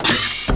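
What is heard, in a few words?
door pneumatic; yoda; PC Gamer 44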